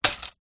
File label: office padded envelope containing small plastic items drop wood; zapsplat